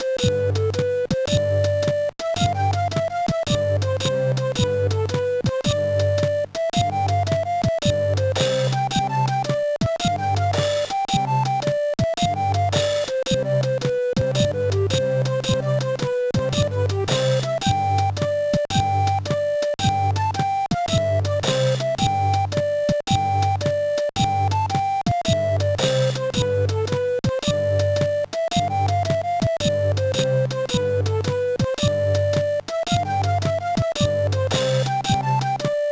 BGM; World Championship Poker 2004 GBA

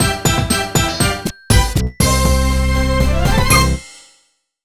Jingle WIN; Super Scribblenauts 2010 NDS; NEW FRONTIER

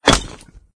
Block Explode; Doodle Jump 2

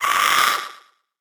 pain

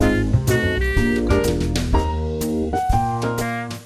serving music; Sesame Street Ready Set Grover

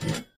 Spike; Doodle Jump 2

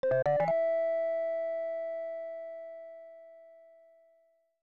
Unused Jingle; Super Double Yakuman 2; SNES

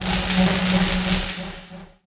Loop Magic

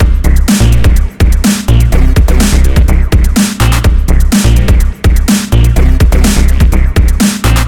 Squirrel Disco Loop; trials of the blood dragon

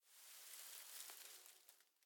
Hay Movement; Devour; The Town; devour 2021 gamerip pc macos

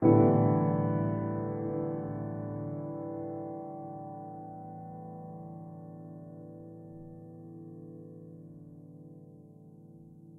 Piano

